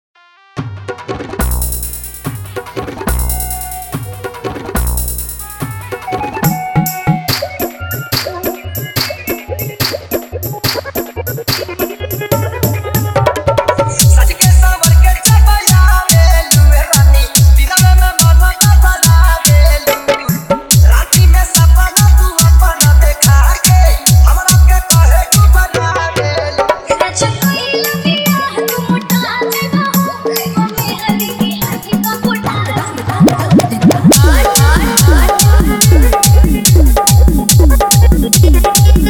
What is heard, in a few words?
Insta Viral Song Old Bhojpuri Dj Song